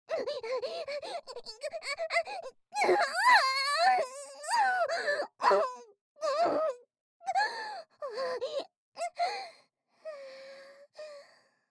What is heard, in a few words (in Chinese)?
Gaochao high; 搾精悪魔ちゃんの5日間寸止めゲーム～最後の日に失敗しちゃったら惨めな結末を迎えるよ～